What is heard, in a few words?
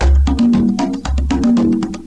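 rhythm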